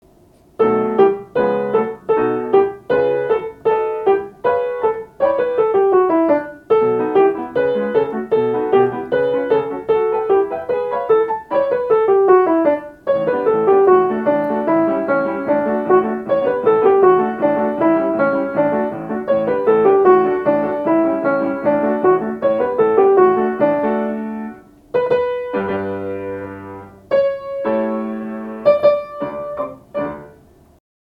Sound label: melody